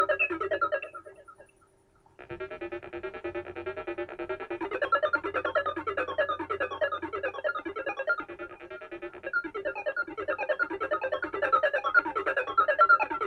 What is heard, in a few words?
Buchla